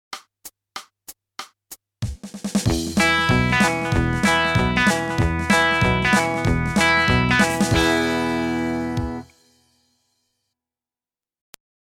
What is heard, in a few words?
country